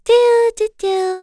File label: Vox Hum; kingsraid; voices heroes; en